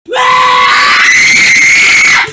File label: screaming; salah